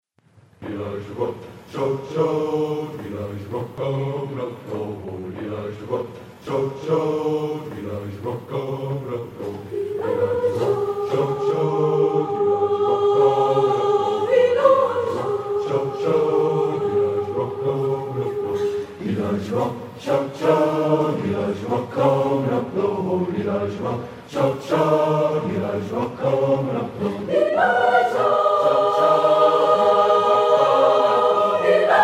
gospel spiritual choir; usc pantheon choir

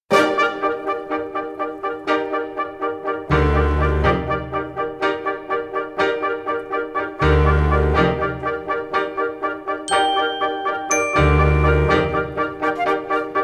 callback accomp

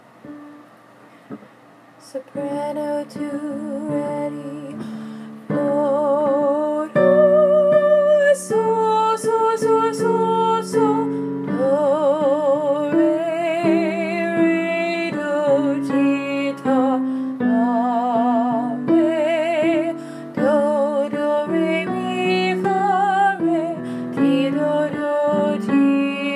soprano solfege

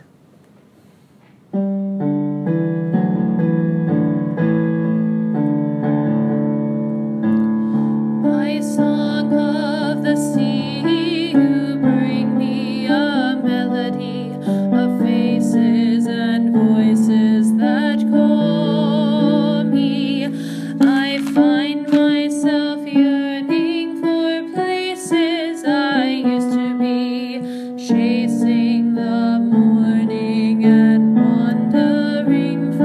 song of the sea alto

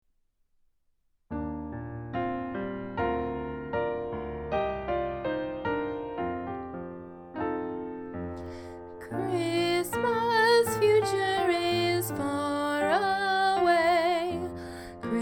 solo practice

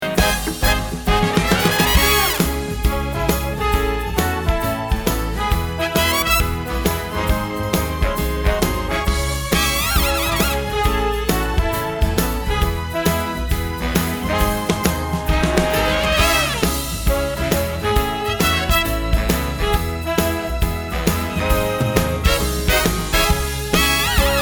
audition song accomp

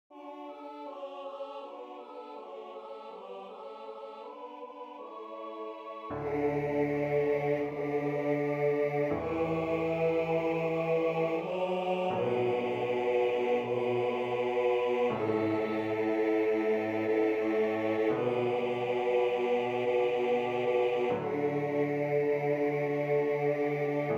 bass

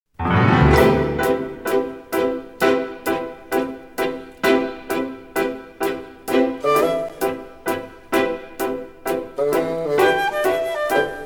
callback accomp

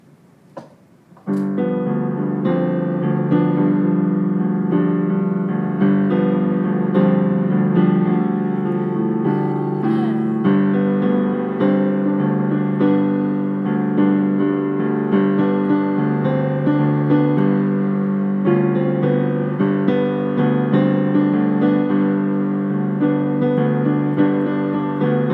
piano only

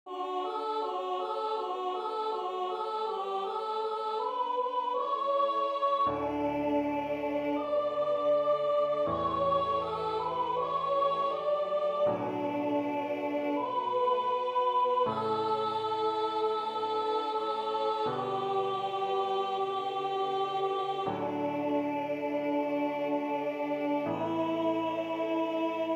soprano

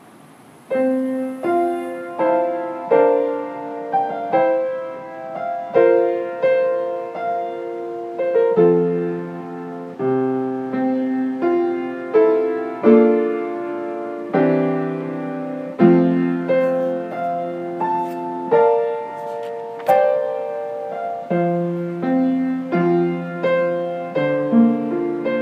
piano only

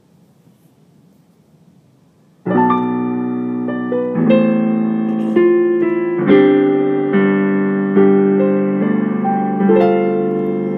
with vocals

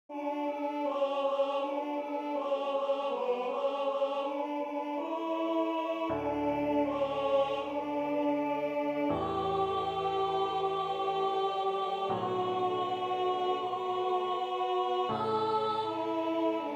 alto

